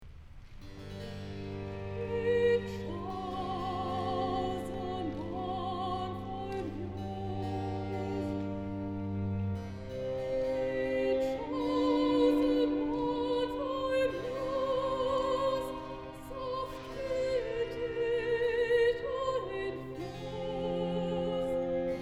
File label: Recitative; He chose a mournful muse